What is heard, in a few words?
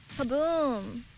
kaboom